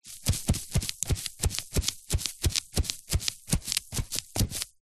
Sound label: office sponge brush wet paint dab on paper; zapsplat